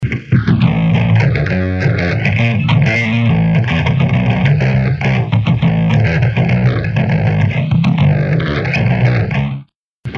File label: Clockworks